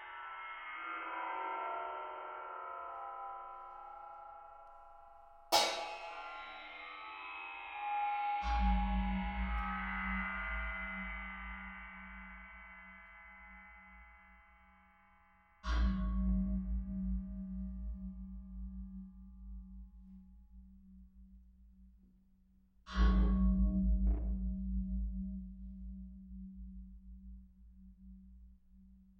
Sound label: concert ambisonics